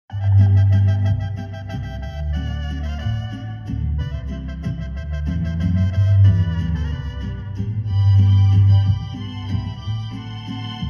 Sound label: music